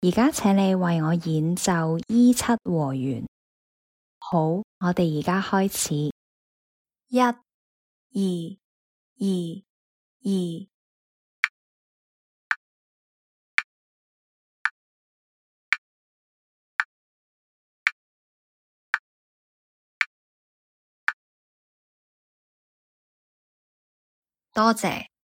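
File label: dominant 7th in the key of E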